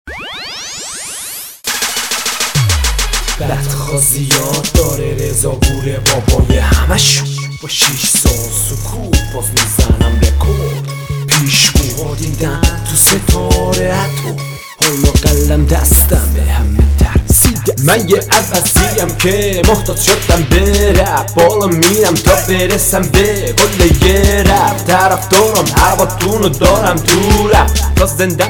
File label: Intro, Gangsta HipHop Music